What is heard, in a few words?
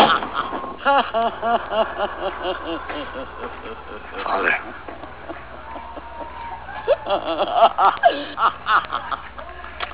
exorcist laugh